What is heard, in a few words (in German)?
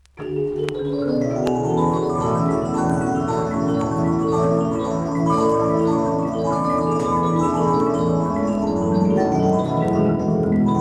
Musikdose mit Metallscheibe